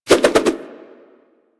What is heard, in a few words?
Nunchaku Spin Whoosh, Weapons; Warframe; Tenno